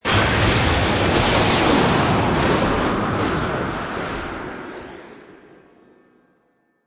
Magical Flurry Blasts, Magic sound of casting spell, conjuring or wizardry; Lynne Music